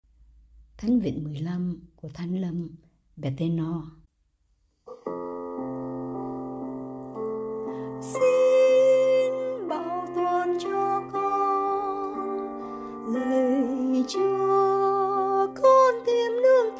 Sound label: Tenor